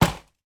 empty cereal box put down on table

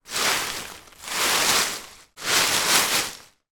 foley leaves dried autumn many movement hands; zapsplat

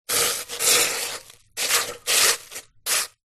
household water sizzle swirl around in pan; zapsplat

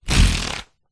foley paper pad page rip tear binder rings; zapsplat

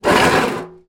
small metal case tin push slide concrete; foley; zapsplat

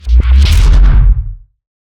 Whooshes Impacts Whoosh; soundbits

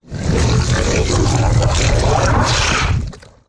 horror monster swamp growl slimy wet

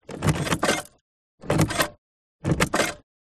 AUTOMOBILE S-TYPE Jaguar Contact Key; 2000; fox audio

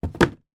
foley card shoe box down on surface; zapsplat

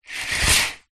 household shower curtain pull

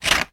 office scissors cut paper sugar single; sound effects one

